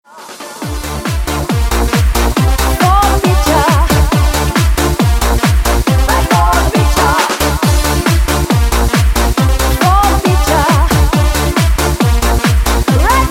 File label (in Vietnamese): Nhac San; nhac khong loi